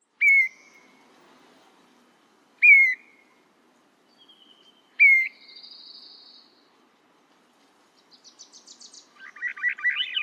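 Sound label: sounds of thrush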